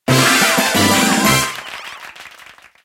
bubble win

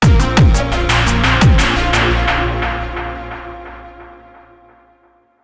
DramaticEvent

